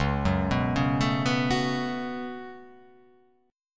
C sharp 7th Suspended 4th Sharp 5th C sharp

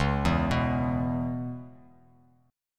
Db-Minor 7th-Db-x,4,2,4-down, Dbm7 chord; arpeggiated; JGuitar

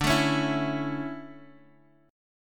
D Major 7th Suspended 2nd, D, down; strummed

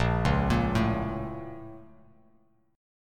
A sharp Minor Major 7th, Bass, chord; A#mM7 arpeggiated; Bass Standard; JGuitar

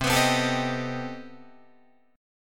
C Minor Major 13th C down Guitar DADGAD

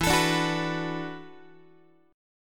E 7th Suspended 2nd Suspended 4th, down, Guitar; E7sus2sus4 strummed; x,12,12,12,10,10; JGuitar

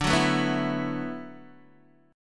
D 6th Add 9th D, Guitar, Open D

